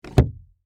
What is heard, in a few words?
Door Latch Open, Foley, Wood, Door, Latch, Open; Latches